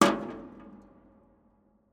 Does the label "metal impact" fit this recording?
Yes